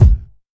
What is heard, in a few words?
round kick drum sample a key